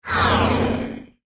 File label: 80S Sci Fi Style Game Fx; Robot Sound Effects; science fiction sound effect; Andrew Fleming